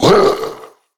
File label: Bowser Grunt; Odyssey